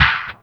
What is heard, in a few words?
loop bit; Spectrasonics; Liquid Grooves; LOOP BITS